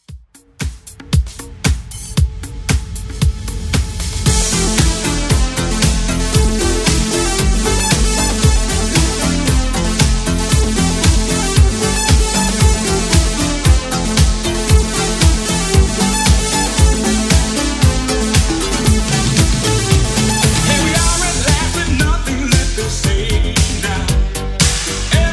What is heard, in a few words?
80s Redrum 115bpm